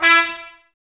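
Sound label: Brass trumpets E, Mute; Miroslav Vitous - Woodwind Brass Ensemble; Tps Mute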